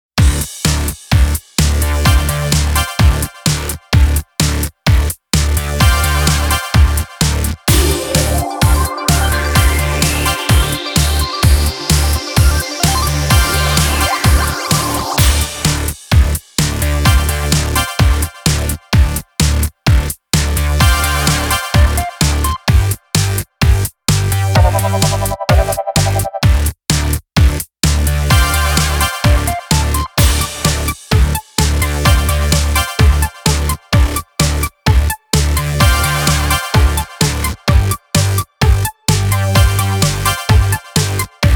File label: (Off Vocal); ラブライブ！サンシャイン!!